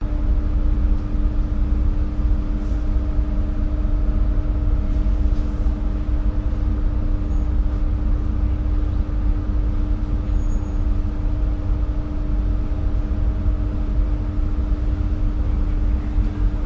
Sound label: ferry before departure on board vehicles entering; your free sounds